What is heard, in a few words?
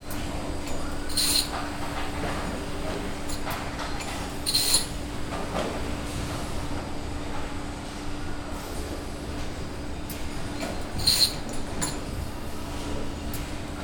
Medium Distance - Machine Hall, Ambiance, Air Compressor, Hydraulics; Double Trouble Audio